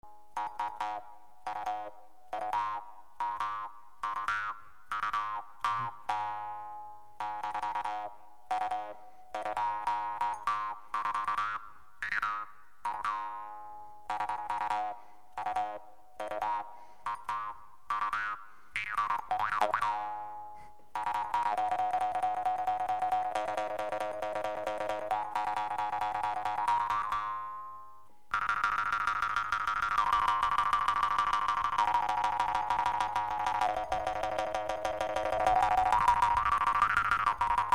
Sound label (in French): guimbarde